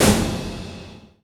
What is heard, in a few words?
movie, impacts & hits; Techno Trance Essentials